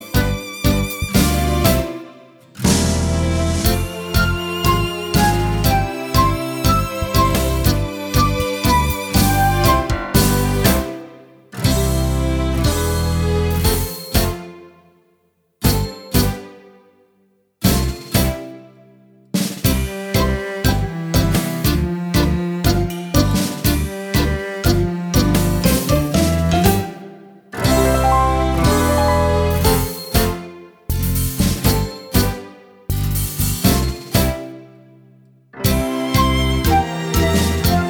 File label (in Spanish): tango